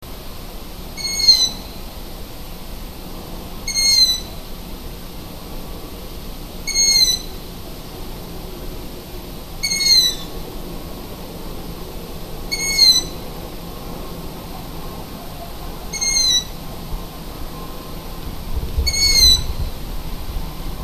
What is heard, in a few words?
asio otus jung, vogel; hecke; biologie